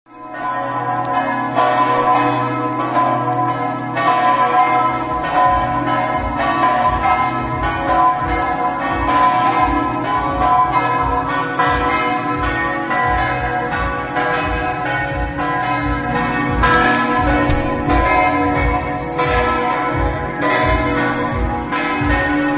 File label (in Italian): pagnacco, campane